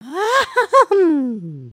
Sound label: big love, emotes